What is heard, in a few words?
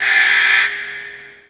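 alert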